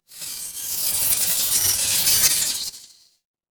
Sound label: magic scifi spell